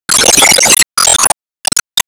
Enemy Tower Respawn

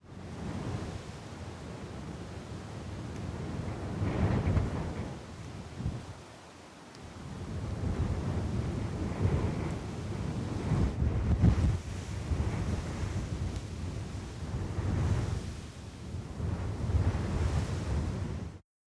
Intrepid potash Wind; Google Earth VR

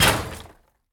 Break Metal

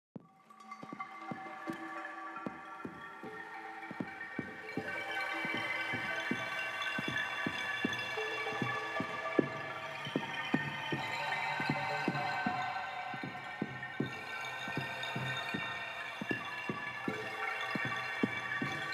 Synths 156; Aurora Borealis

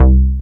Bass

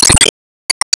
Ingame Score Count Minus

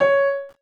VOC PIANO; gamerip; PS3

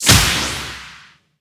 Smash Shot; Disney Star Smash; Android, iOS, Mobile

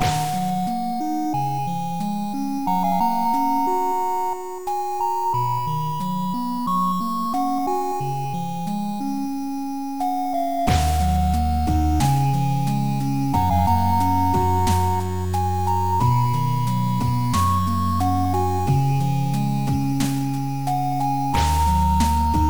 Campfire Loop; Astalon Tears of the Earth

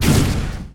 Flare; Se Flare

